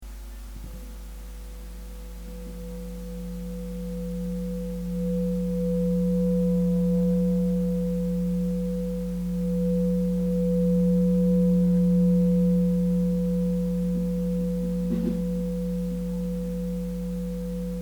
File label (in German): klangs, Klangschalen